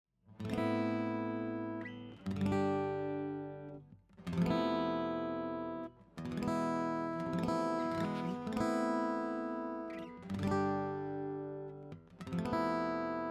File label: Varied Chords, guitar